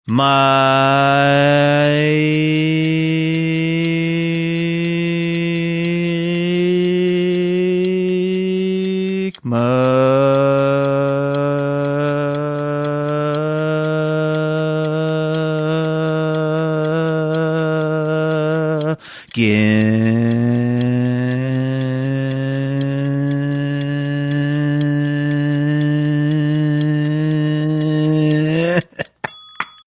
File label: car engine revving